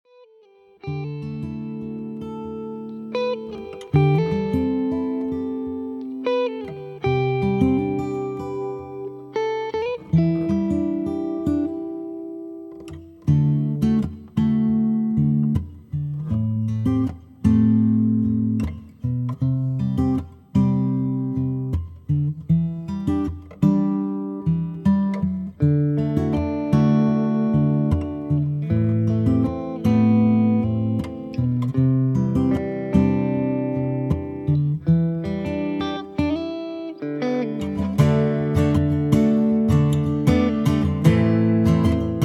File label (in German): G-Dur